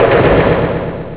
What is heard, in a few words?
bonk